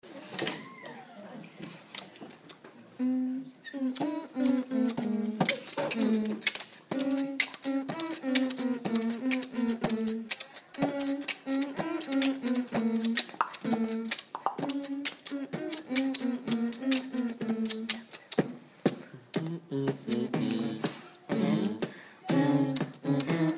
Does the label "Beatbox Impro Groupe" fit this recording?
Yes